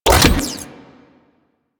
Briefcase Launcher Mech; Warframe; Weapons; Corpus

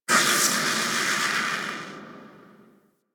Ship Machine Steam Blast; Ambience; Warframe; Corpus Ship Remaster

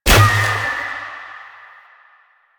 Choir Bow Fire; Warframe; Weapons

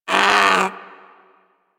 Predator Vocal Pain, Hunting Critters; Warframe

